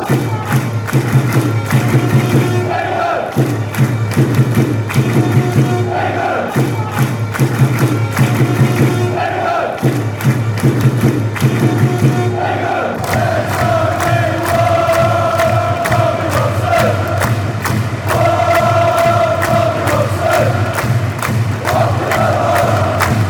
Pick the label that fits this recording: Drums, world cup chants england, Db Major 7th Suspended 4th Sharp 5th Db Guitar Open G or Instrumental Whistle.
world cup chants england